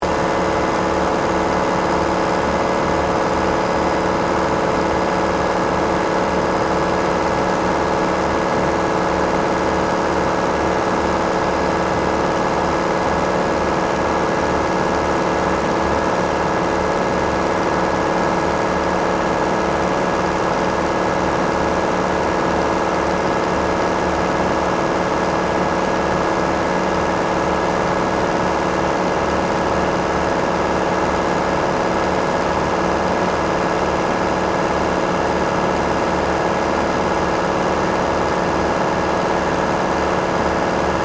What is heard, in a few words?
Ice Rink Motor, Refrigeration, Compressor, Run; Industry, Ice, Rink, Refrigeration, Compressor, Motor, Run; Miscellaneous Bar; BlastWave